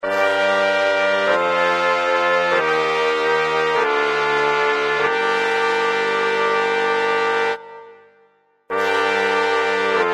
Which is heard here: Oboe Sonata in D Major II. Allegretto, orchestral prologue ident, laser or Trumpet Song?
Trumpet Song